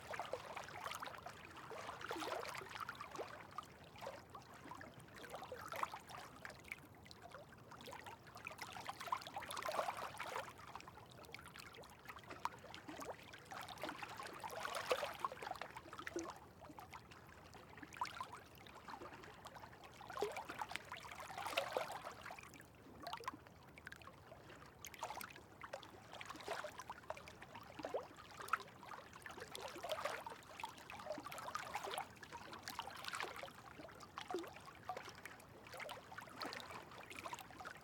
loch lochy small quiet waves wavelets ripple lake water movement, Scottish Highlands; aXLsound